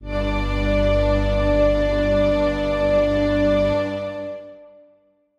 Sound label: Strings